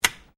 Door Sliding Exterior Aluminium Flyscreen Latch Lock; Various doors